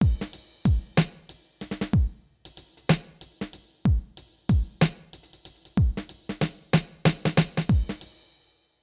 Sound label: Beat